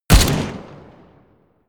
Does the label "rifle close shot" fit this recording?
Yes